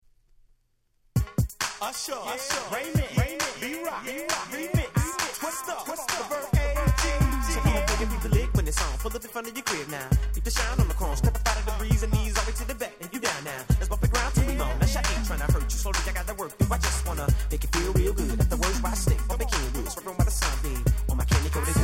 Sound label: nice slow bass